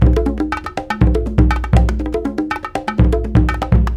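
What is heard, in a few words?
Busy Drum Whistle, African Contemporary; Spectrasonics; Supreme Beats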